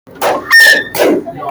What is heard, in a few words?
clap screech clap clap